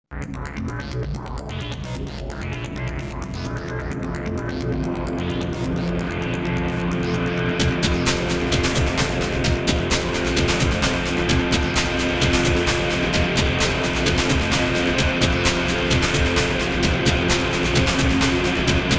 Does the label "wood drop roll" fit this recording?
No